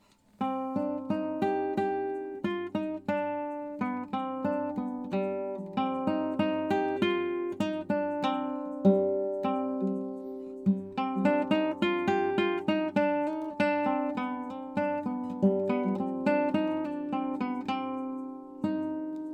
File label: Dance interlude